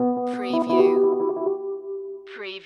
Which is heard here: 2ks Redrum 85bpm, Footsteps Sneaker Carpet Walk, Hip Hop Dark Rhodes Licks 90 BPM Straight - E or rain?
Hip Hop Dark Rhodes Licks 90 BPM Straight - E